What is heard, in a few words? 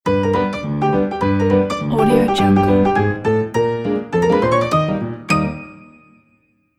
the cartoon ident